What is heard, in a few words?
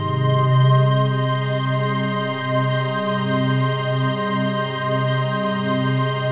TRANCPAD; Best Service; XX Large Pads; Sample CDs